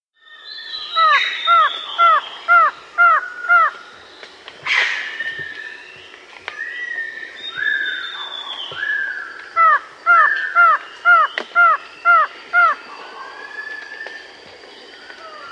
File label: White-Shouldered Antshrike; S. America